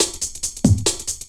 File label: LOOP SD, Drum & Bass, 140 BPM; Ueberschall; Drum & Bass Resonance